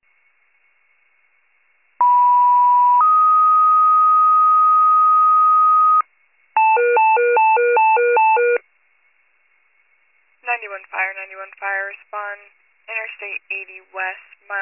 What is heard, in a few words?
fire, pager alert